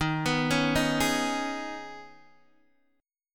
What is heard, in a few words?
D sharp 7th Suspended 4th Sharp 5th D sharp, Guitar, Open D